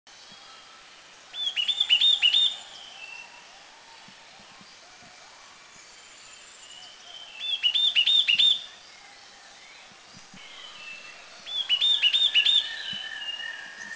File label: Yellow-cheeked Tit; Mishmi; India